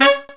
Brass Stab; CMI

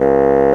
Bassoon; CMI

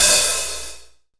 Kit Heavy HHOP; CMI

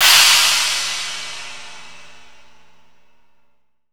Kit Heavy; CMI

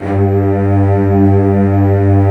Cellos; CMI

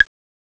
BLOCK; CMI

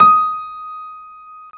Piano Grand; CMI

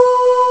CHOIR, CMI

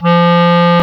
Clarinet; CMI

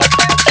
RHYTHM, CMI

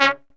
Brass Stab; CMI

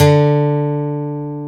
Gtr Acc; CMI

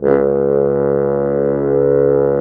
Brass Mute; CMI

